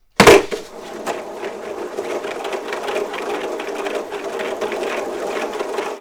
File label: Skateboard Hit Roll; Fairlight Sound Lib